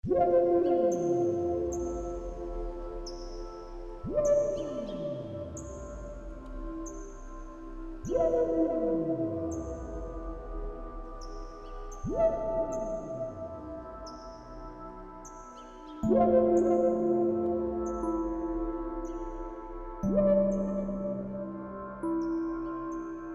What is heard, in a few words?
Forest Cry Instrumental